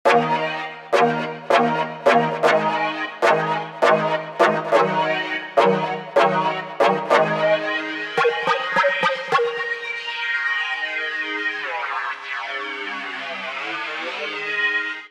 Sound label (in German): Stabsound mit Filter und Spectral Morphing